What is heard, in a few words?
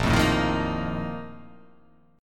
A sharp Major 7th Suspended 4th